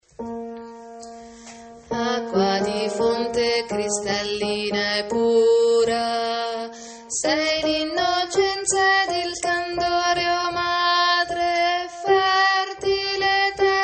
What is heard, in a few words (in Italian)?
contralti